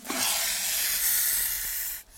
hiss

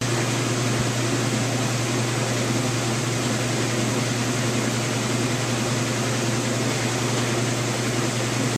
washing; CosmicRage

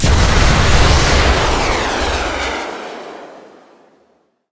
salvo, weapons

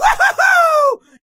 cheer

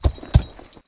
walk, horses

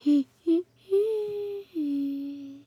y hum, birthday; CosmicRage

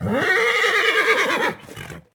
turn, horse; combat; creatures

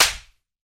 slap; CosmicRage

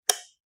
light switch; CosmicRage

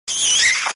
kiss

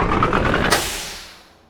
lift up

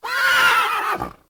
horse, Farming; CosmicRage

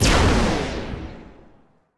lasers; star study

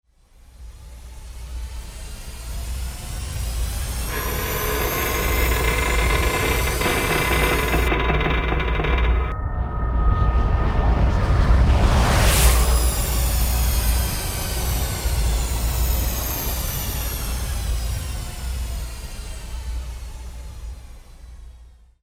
engine scream, ships; Cosmic Rage; ships waypoints

